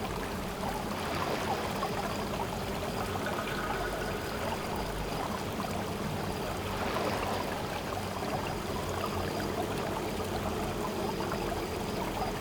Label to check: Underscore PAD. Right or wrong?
Wrong